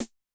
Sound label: Analog L, Yamaha DTXpress-II snare